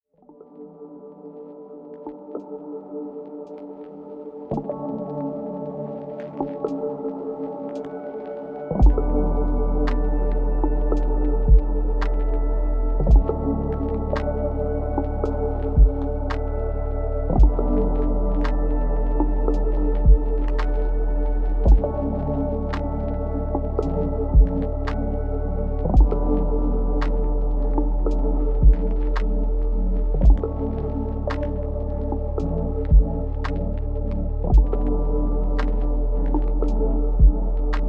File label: Sad Lo-Fi